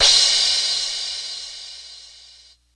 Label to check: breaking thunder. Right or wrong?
Wrong